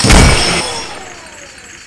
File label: junkgun